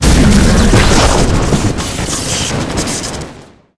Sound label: mech ape lord dead, monster